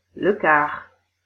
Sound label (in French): car